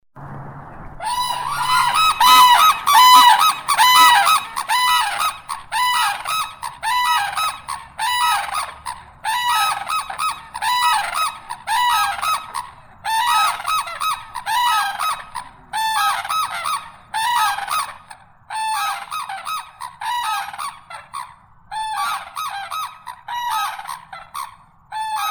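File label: red-crowned crane, Grus japonensis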